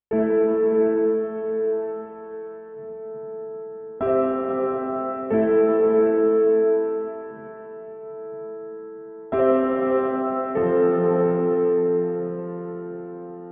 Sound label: Piano Mix